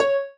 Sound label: marimba rainbow; ゆめ2っき